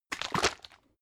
bottle syrup shake single, gastronomie

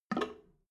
drain valve open soft, Badezimmer; haus garten

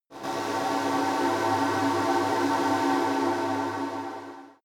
shot dark shining glance, sweeps und swells; comedy-cartoon; soundpool

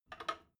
drain valve lever handle short, Badezimmer; haus garten

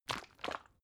bottle syrup shake single viscous, gastronomie

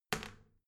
toilet seat wall open soft, badezimmer

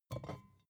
bottle vodka pick up grab, gastronomie

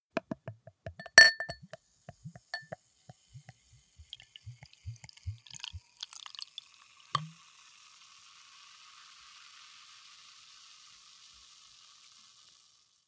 beer pour into glass, gastronomie

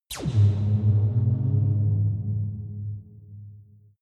lightspeed jump, raumschiff

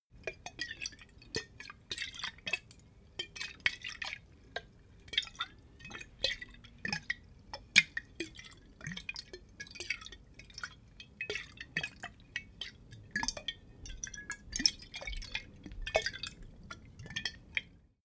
bottle vodka movement, gastronomie

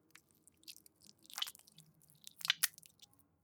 softsoap hand smear, badezimmer

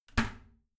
toilet lid slam soft, badezimmer

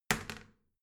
toilet seat wall open regular, badezimmer

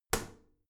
toilet lid wall open regular, badezimmer

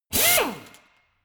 impactor medium, bohrer; maschinen

